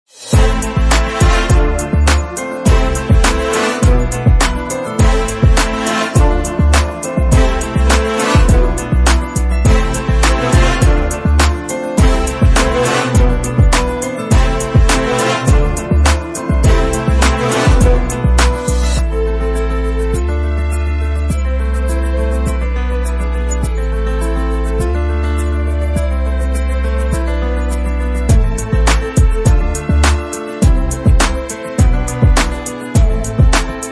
Background hip hop jazz music

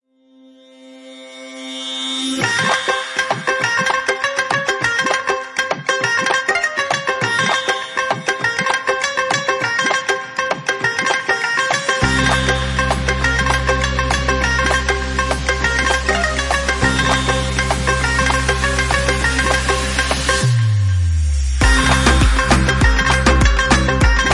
Bollywood

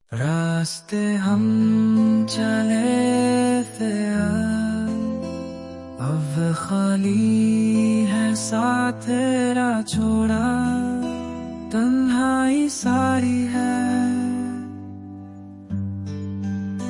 bollywood

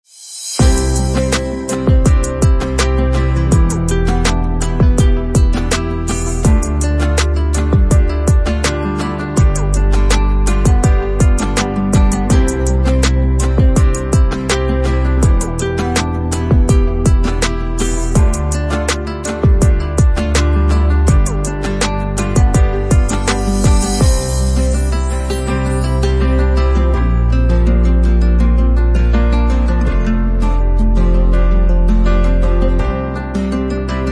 Dramatic light background short music ringtone

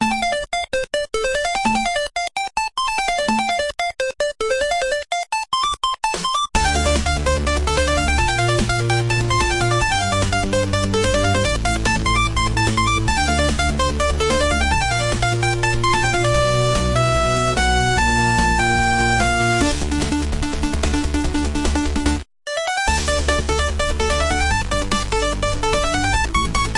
Chiptune alarm ringtone song

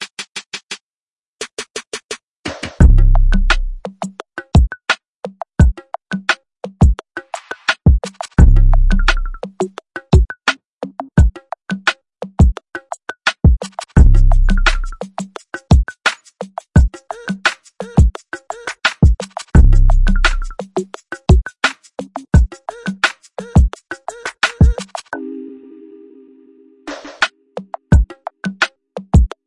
Rythmic trap beat

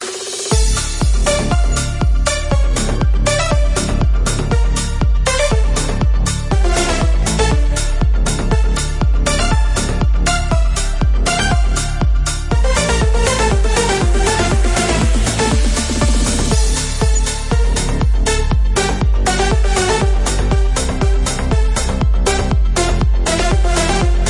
Funny background dance music for video blog

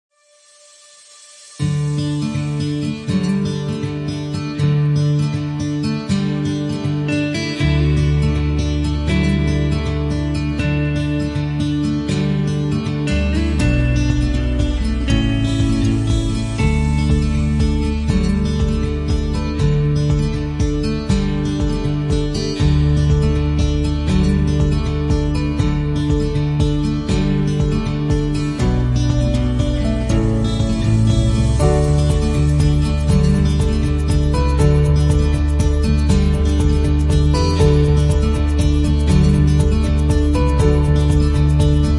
Energetic indie folk

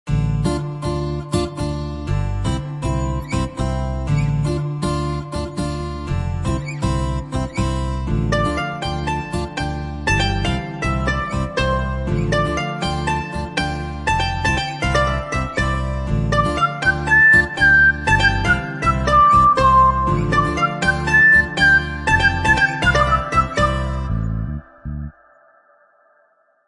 Love bgm bollywood

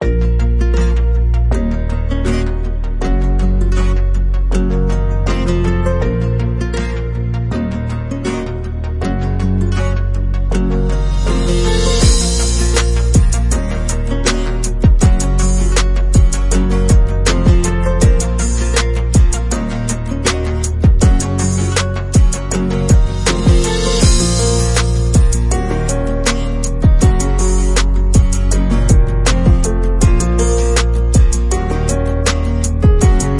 cinematic orchestral background music; White Records

